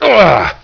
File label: pain; wanted